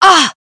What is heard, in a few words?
pain; Valorant; cstrike; agent; jett